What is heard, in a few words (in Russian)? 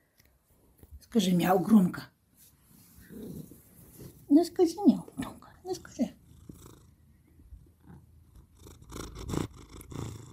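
кота уговаривают сказать мяу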